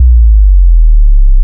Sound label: junga sub down